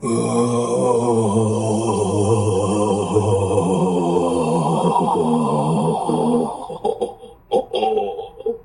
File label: AI groan; pervert; Dungeon Crawler Carl